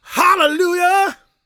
GOSP MALE, Vocal Planet Gospel

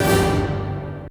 STAB; ZERO-G; Datafile

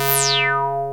ACID BASS; ZERO-G; Datafile

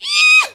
VOC

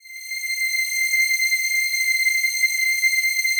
PAD, Pads; Best Service; XX Large

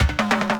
ROLLS; E-Lab; X Static Goldmine 2

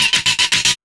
SYN RIF

